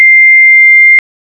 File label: ccitt "EO"; FoneTonePro; Tone Generators; Phreaking